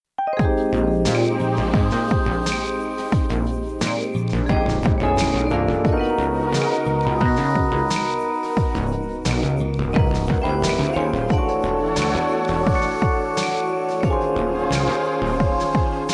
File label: Electro Arrangement